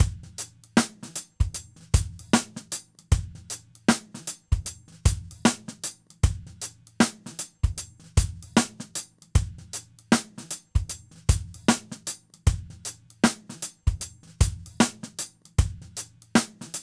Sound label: drums